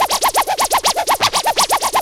TEK MOOGS, Techno; AMG - Now CD-ROM (Roland)

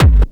hardcore 1, bassdrum; Ueberschall; Techno Trance Essentials; DRUMS; BD HARDCORE2

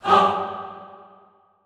HAH G2, Classical Choir, SHORT HAH; Best Service

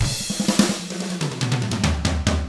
HARD ROCK, Drums; ILIO; Double Platinum Drums 1

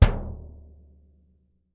BD M, Percussion; Miroslav Vitous - Percussion & Harp

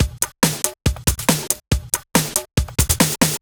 Break Beats, 140 BPM

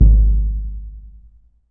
EDGE TONE, Surdo; E-MU Producer Series Vol. 2; More Studio Essentials; Composer

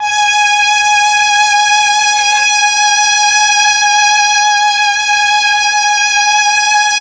MEL STR, Legendary M400; ILIO; Propeller Island

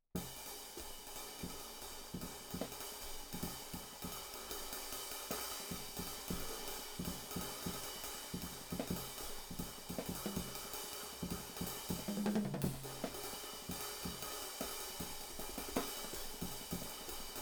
OH HH, DRUM N BASS:JUNGLE BEATS; RAW MULTITRACKS

